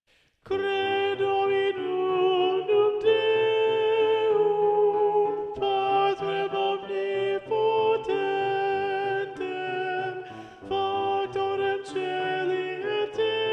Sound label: Alto; Mass No2 in G Mvt 3 Credo; Practice Room